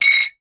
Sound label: Metal Clang